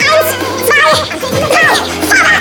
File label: HI LOOP; Big Beat Samples & More; AKAI; ALL LOOP KIT